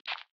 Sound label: delete; craftguide